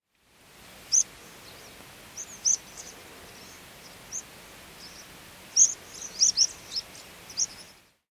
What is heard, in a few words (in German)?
bergpieper